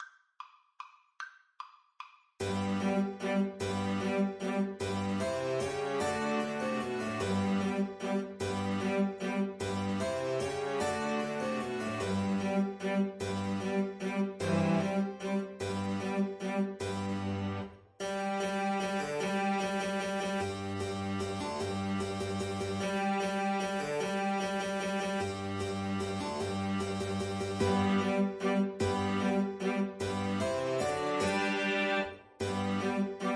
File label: autumn violin